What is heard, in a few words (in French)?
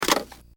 raccrocher